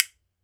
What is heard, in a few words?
B. BONES, Celtic Percussion